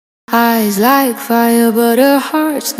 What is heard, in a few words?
reverse reverb dry